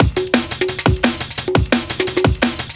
Groove 173

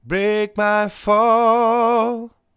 Break My Fall, Vocal Phrases; Ultimate Vocals

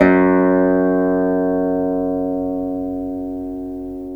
VEENA F#3; Spectrasonics; Heart of Asia

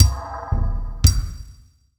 Industrial Loops

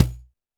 percussion, Roland TD-20

